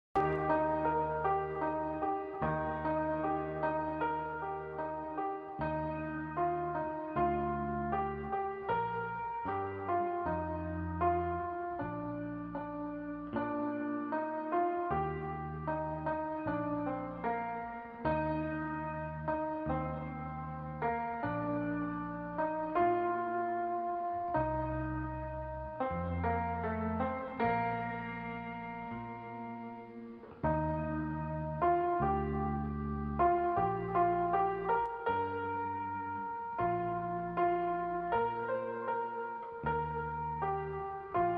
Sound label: Piano, College Song